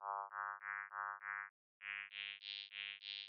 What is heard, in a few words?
bright bright same